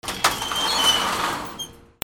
DOORS

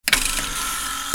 SCIFI

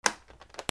OFFICE

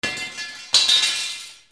BANGS

